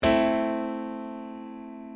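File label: piano chord A minor; circle of fifths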